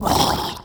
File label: angry gurgle